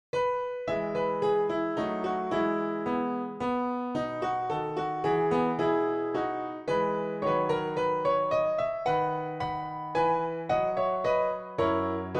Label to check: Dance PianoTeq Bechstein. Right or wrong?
Right